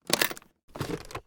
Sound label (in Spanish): ABRIR CAJA VINO; Yesterday Origins